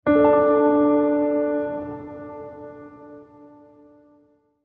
Wonder Chord

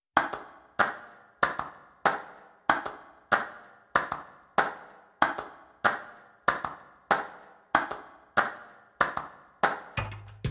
Drums Djembe Clap

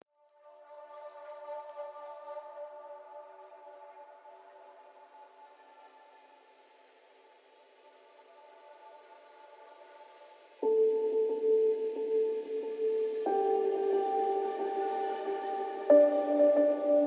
Haunting Mix

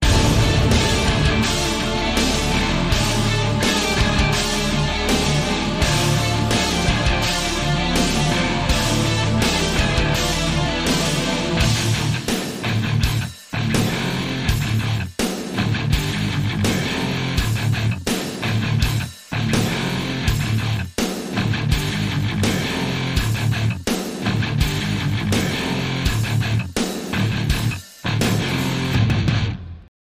Metal Attack

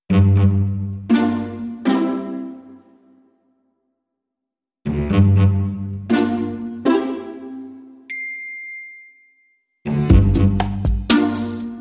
Drum Machine Underscore

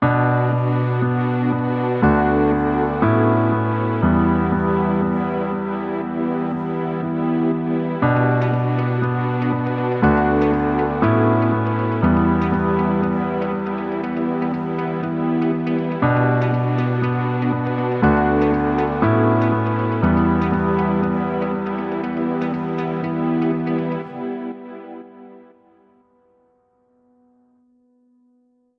Amazing Life, No Drums

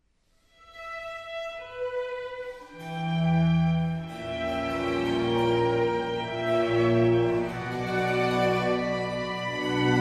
Concerto in E minor for 4 violins strings & bc; RV550; Antonio Vivaldi

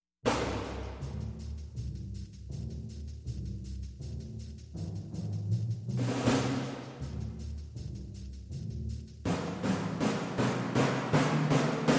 Percussion Only

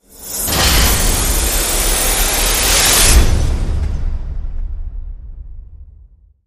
Rise Short; Rise Short Flare Up

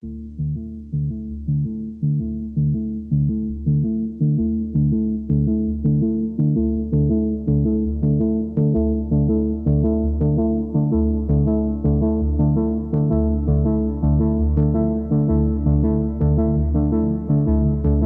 Order, Sequence

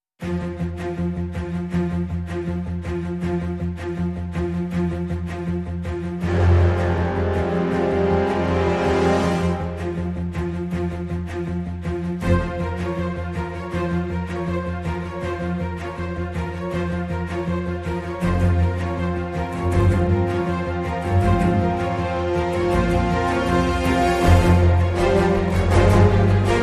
No Perc

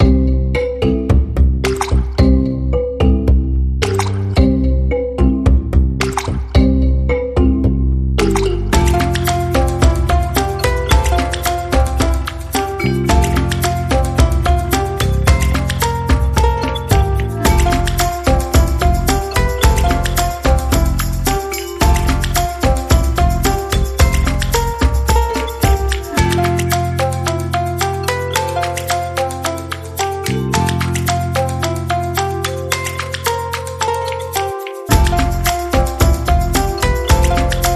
Asian Lullaby

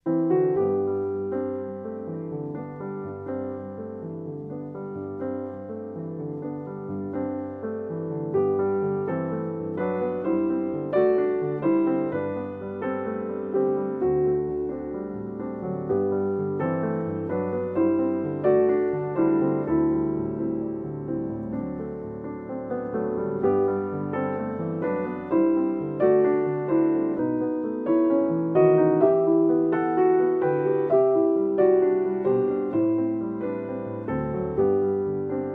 Solo Piano

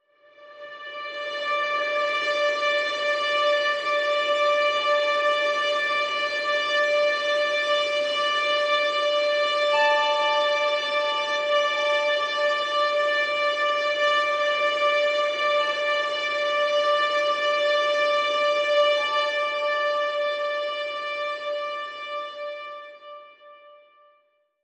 Hushed Strings Layer

